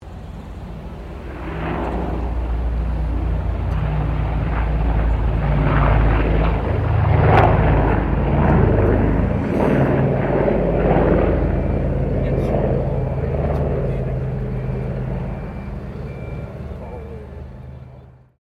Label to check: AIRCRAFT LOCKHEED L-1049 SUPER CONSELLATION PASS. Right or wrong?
Right